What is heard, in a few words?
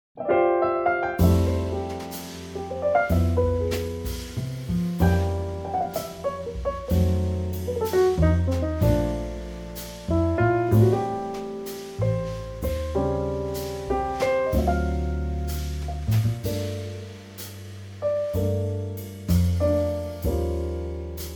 F trio